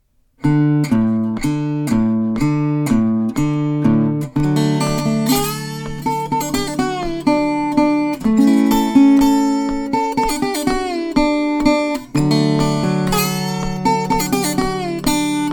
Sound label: Big Island Polka